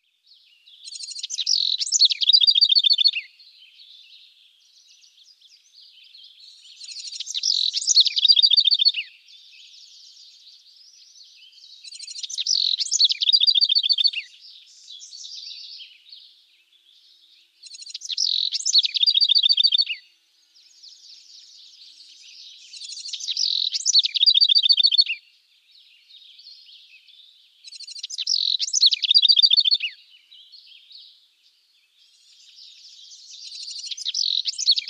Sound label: Wren House Chirps Mus